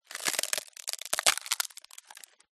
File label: palm branch dead dry snap break; foley